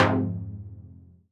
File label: Fm Dub Chord